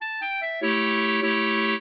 clarinet; Mozart

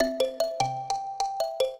trio, mbira; Mozart

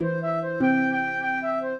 trio, flute harp; Mozart

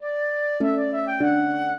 minuet, flute harp; Mozart

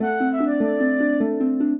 trio, flute harp; Mozart

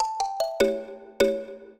trio, mbira; Mozart

